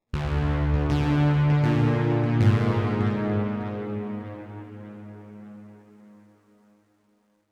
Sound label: Oneshot Synth; Zombie Army 4 Dead War